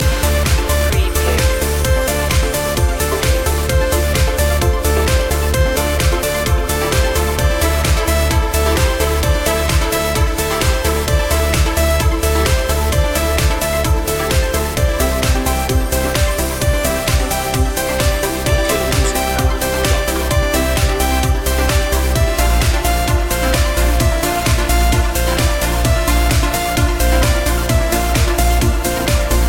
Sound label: electric anthem loop